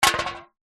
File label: drink soda can empty drop knock over wood deck; zapsplat